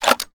draw, glock; weapons; Urban-Coalition; Decay; arccw ud

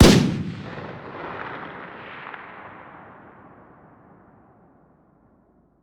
fire dist; weapons; m16; arccw ud

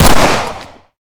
fire, glock; weapons; Urban-Coalition; Decay; arccw ud

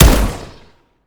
fire, weapons 870; Urban-Coalition; Decay; arccw ud

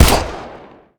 fire, uzi; Urban-Coalition; Decay; arccw ud

